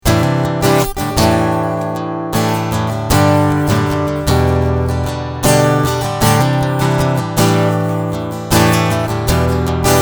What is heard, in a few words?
Strumming EQ Full